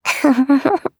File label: laugh, JPN; Eternal Return; Katja